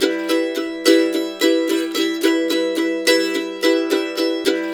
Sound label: Blues Guitar riff; ILIO - Fretworks